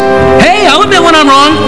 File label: wrong